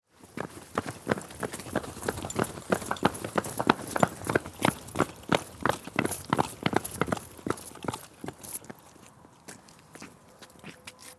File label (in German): laufen im rudel